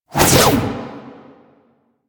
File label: Backflip Whoosh